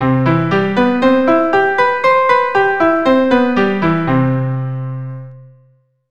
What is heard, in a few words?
CM7 Arp 100, keyboard; CM7 Arpeggio